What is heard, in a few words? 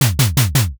small animal run